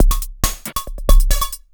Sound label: percussion loops 138; PS-45